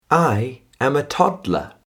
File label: toddler